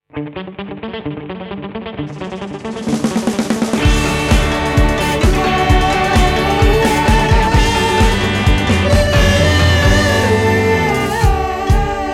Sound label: jingle